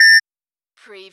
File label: Servo Panel Sound - Beep long